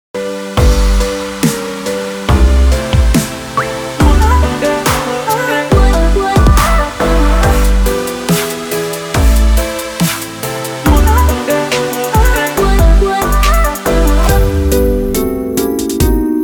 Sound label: SYNTHS